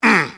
grunt, Male; voice; Tribes2

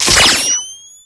sniper activate, weapons; Tribes2

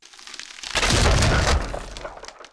icefall, environment; t2-mapper

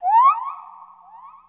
bird echo; environment; Tribes2

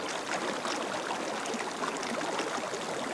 river, environment; t2-mapper

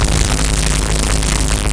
ELF hit, weapons; Tribes2

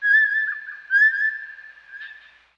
bird echo, environment; t2-mapper

